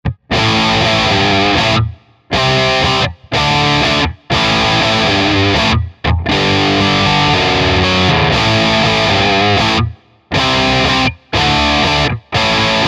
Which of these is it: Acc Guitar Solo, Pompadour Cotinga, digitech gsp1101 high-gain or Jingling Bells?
digitech gsp1101 high-gain